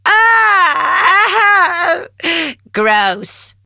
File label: death; awesomenauts